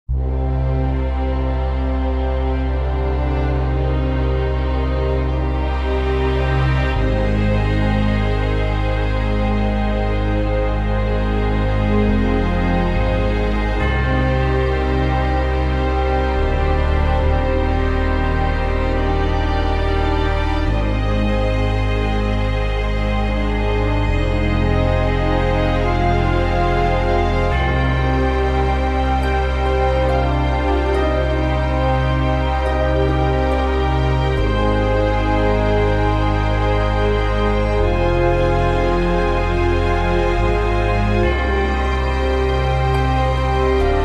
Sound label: Production Music; Invicta Beam